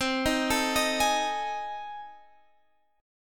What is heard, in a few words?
A Minor Major 7th Flat 5th